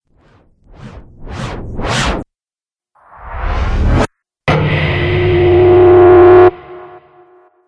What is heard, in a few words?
Cinematic Riser Pack; Rise And Dive Sound Effects